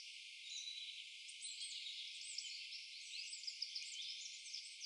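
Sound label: birds loop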